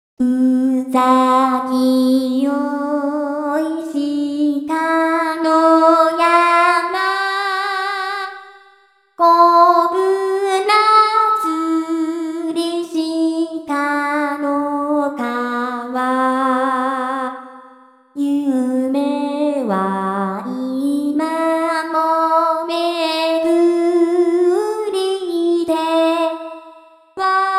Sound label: home town voice